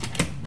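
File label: steel door close; doors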